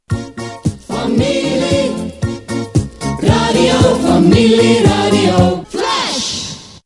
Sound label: jingle; Flash